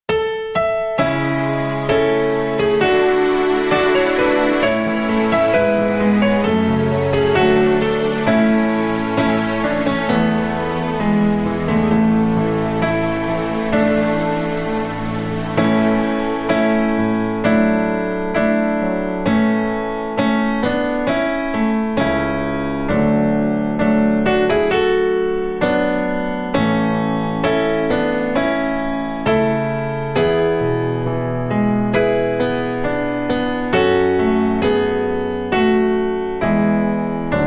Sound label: Borrowed Wings (A To B) No Backing Vocals